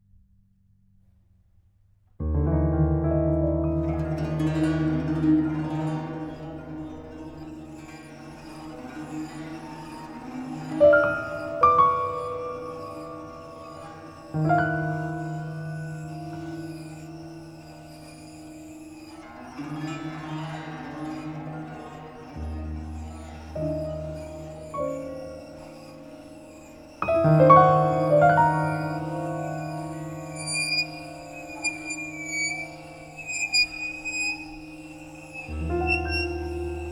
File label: guzheng